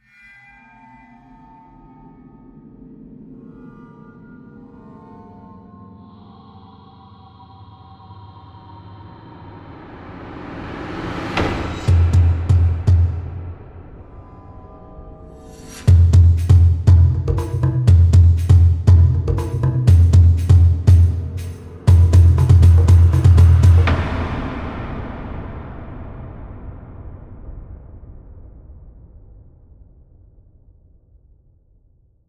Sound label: Trailer Tribal, Production, Element, Trailer, Tribal, Haunting; Trailer Sound Effects; BlastWave